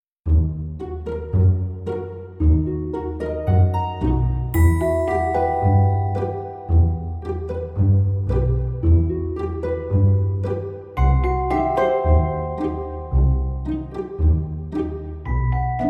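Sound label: alarming music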